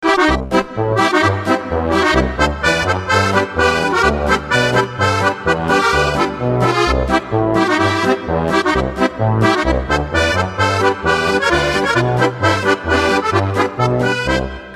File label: Polka